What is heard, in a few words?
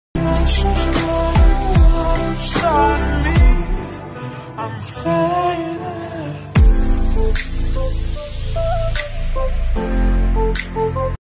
slowly and reverb